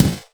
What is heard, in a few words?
snare, Roland R-70